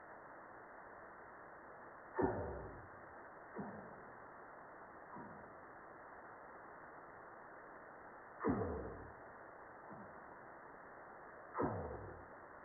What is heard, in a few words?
North Pacific Right Whale